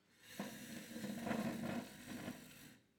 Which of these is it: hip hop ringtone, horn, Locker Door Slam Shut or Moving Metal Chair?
Moving Metal Chair